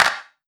Clap

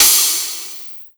soft hitfinish; osu

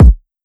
drum hit normal

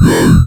Growl

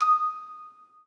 musicbox

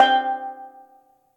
Steel Drum G5

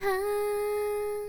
Choir; osu

